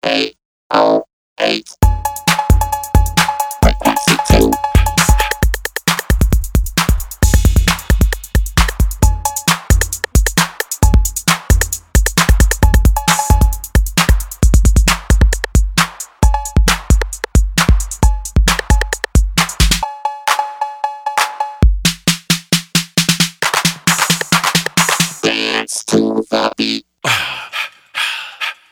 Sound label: PATTERNS FROM HIS ROLAND TR-808; Fundamental Records